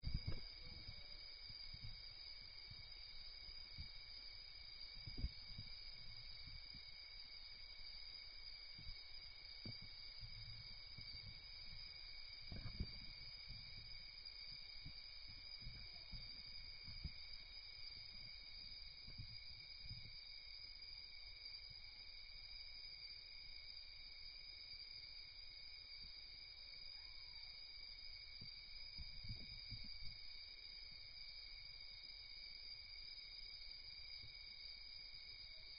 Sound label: Amazonia Sonidos